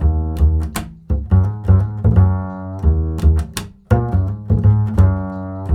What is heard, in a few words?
acid D#, 85 acid swing, bass; Spectrasonics; Bass Legends